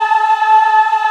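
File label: A4 men women ah, Choir; USB Soundscan vol.28; Acoustic & Synth; AKAI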